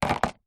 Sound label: food candy bubblegum tape plastic tub set down